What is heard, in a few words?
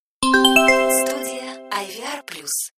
bell